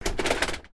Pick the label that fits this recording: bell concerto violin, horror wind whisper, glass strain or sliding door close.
sliding door close